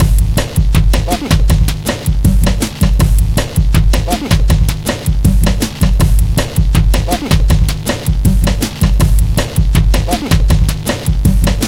15 HEAVY METAL BOSSA 80 bpm, Loops Breaks; POTBreaks

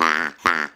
Duck call, cartoon Foley Fx; musicradar; cartoon caper

